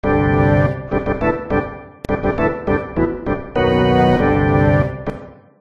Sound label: Ditty